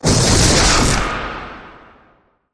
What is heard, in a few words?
Hollow Crashy Blast Impact